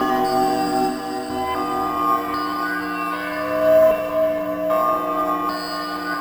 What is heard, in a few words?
Bellish; Sonic Foundry (Sony Creative Software); Synchro Funk